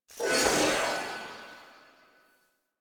Radial Blast Sword Pull; Warframe; Excalibur

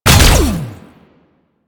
Conduit Fire; Sentient

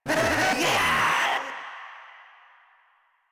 Proto Infested Runner Player Spotted; Warframe

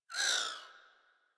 Energy Rifle Projectile; Warframe; Weapons

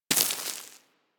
Bullet Impact Debris Dirt; Warframe; Weapons

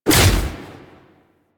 Shield Knock Down; DE; Warframe; Garuda

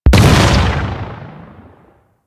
Mine Guided Explosion; Enemies; Abilities; Warframe; Corpus; Arachnoid Camper Terra

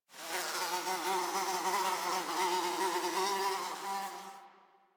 Insect Flyby; Ambience; One Offs; Insects; Warframe; Quests; The New War; Drifter Camp

